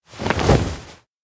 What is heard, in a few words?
stop attack; anemoi